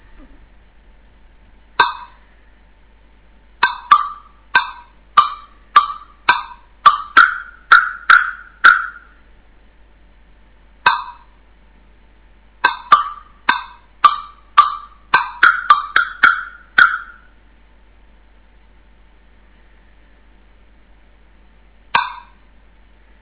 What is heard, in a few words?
knock three tempos